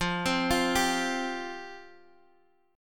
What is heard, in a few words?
F Suspended 2nd Guitar DADGAD, chord; 15,15,15,12,x,x